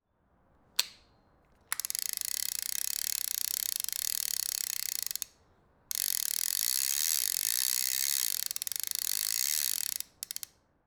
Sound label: Reeling A Fishing Reel